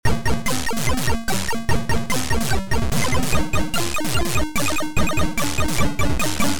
Improvisation; Mutant

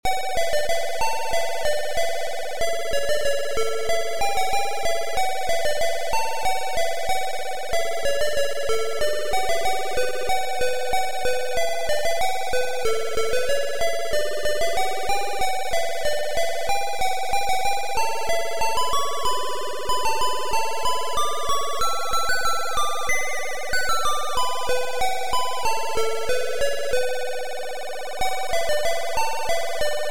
Improvisation; Roshin Ivan

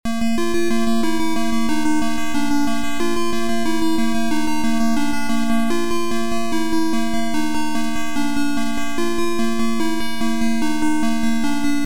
Echo Space Sound; Volume03

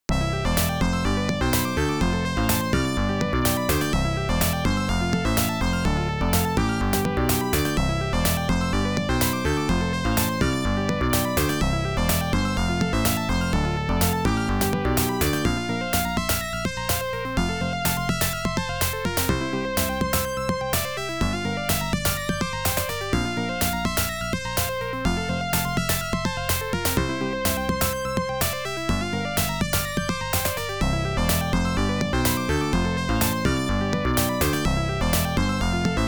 Lovely chip music; Oerg866

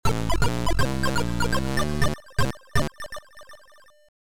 Start Level; Miguel Milas; Kazmus

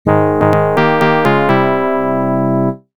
fanfare

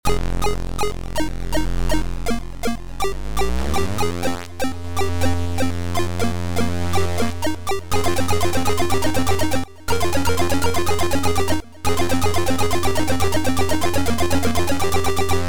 Arpeggio Prism Power

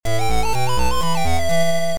Soft Cannon Bubble level start

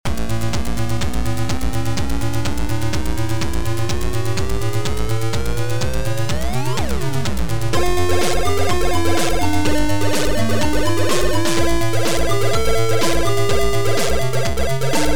Amiga Chiptune

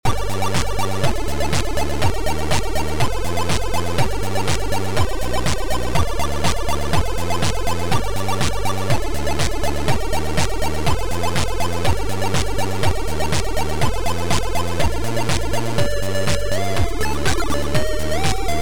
Much Accords

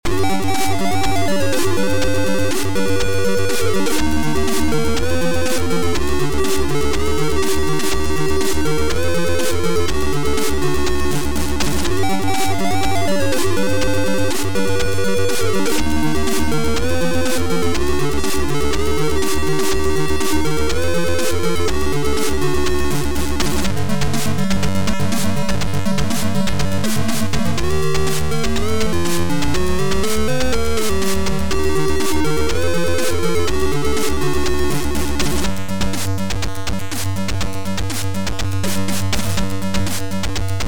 Army style intro

